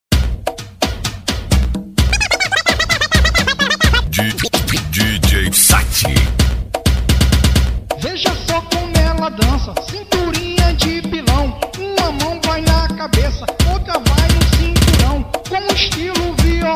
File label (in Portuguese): funk novo